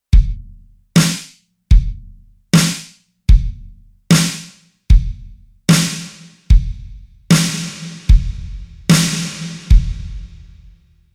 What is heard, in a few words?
Ambience Length